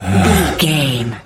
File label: voice male breath sigh